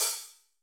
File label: cymbal, Roland MC-909